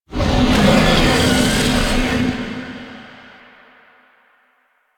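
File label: horse glide start screech; Vocals; Warframe; Vehicles; Duviri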